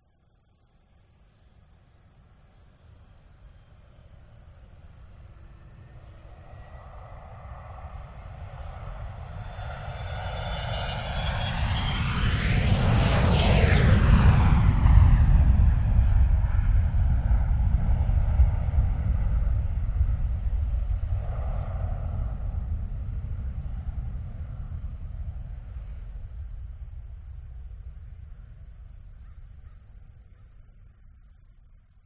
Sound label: vehicles aircraft private jet take off distance